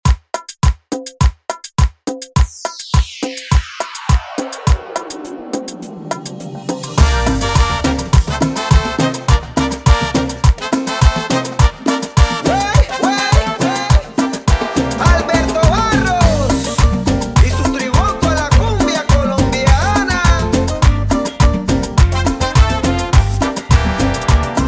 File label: 104 bpm